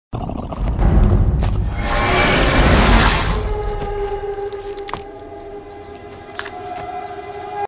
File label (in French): ambiance angoisse